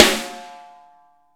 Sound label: SN, Real Mega Drums; Best Service; Real Mega Drums VOL-1; SN AMBIENTEC